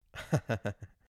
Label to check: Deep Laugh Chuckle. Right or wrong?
Right